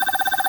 Result Stat Increase Loop

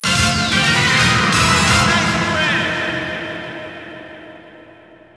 Str G#

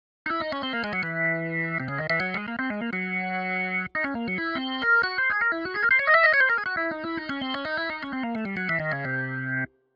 Organ Solo; music; Final boss

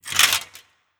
Armour

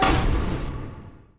UI Counter; Monster Jam Steel Titans

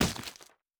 Barrel Death; Hollow Knight

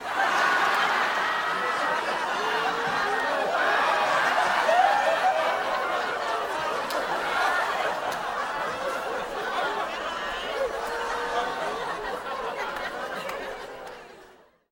Crowd Laugh Long; gamerip

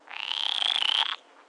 AMB China 3D Amb

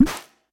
Ui Popup Closed; hello kitty friends match; android

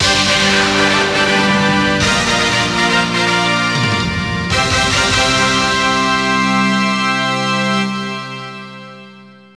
Str G#; Pro Yakyuu Spirits 3 (PS2) (gamerip) (2006)

